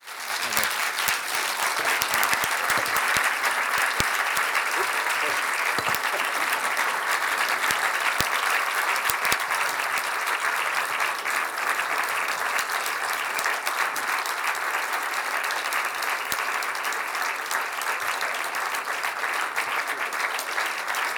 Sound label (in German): schluss applaus